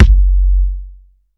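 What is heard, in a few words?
kick; Hollywood Edge; The Big Bad Beatmachine; PROCUSSION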